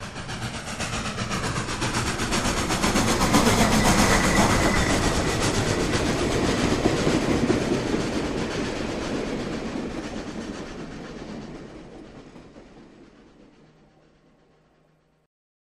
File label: Steam Loco By Med Fast